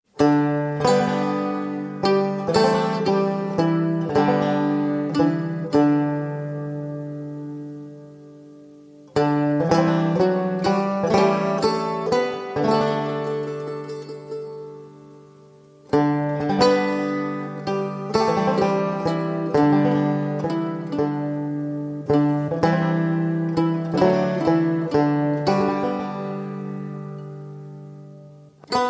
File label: Upon A Banjo Clear, No Bells; Trad (PD)